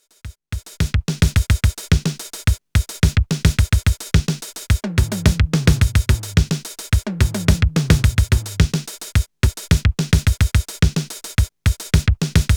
707 Drums and Toms

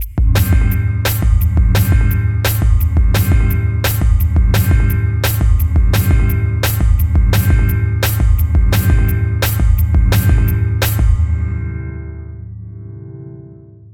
Gong Amp Beat Gong Trip Resonate; AudioThing Hainbach Bundle

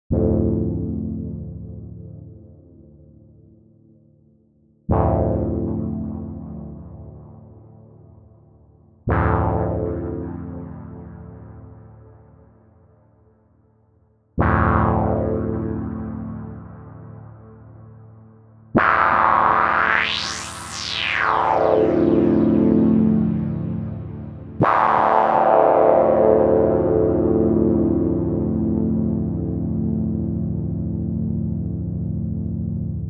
polytone filter; Reason 13

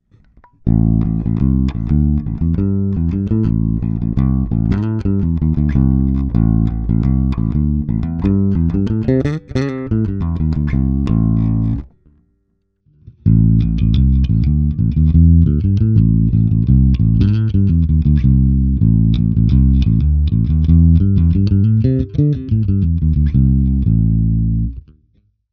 Clean Concert Amp

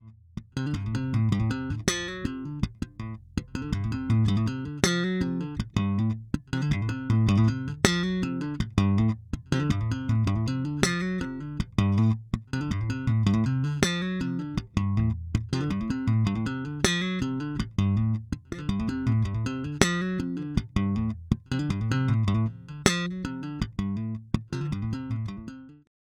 Slap Sound

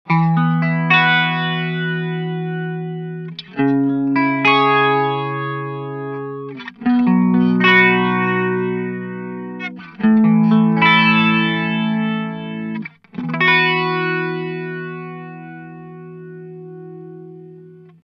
Boss ME 90 Chorus

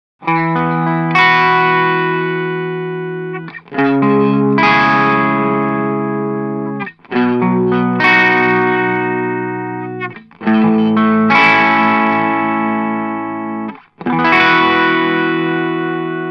Boss ME-90 T-Scream

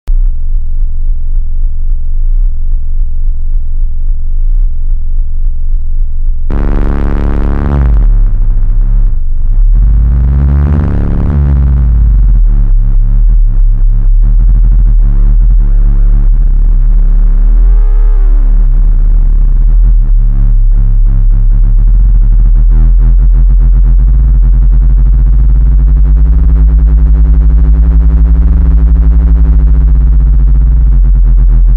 Bass sick

